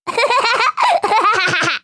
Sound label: Pig Giggle; Dora the Explorer Journey to the Purple Planet; PiratePig